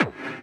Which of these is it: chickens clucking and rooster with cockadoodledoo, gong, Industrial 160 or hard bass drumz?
hard bass drumz